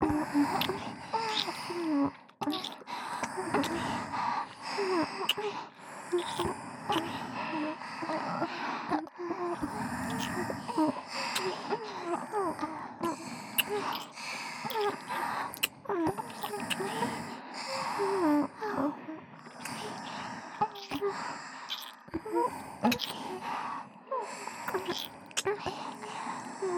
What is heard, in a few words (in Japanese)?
キス 耳元吐息; 美月＆美星のWキス＋耳元吐息